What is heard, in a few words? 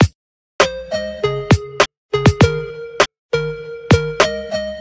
R&B Beat, 100bpm; 8-beat R&B instrumentals from sounds extracted from chart song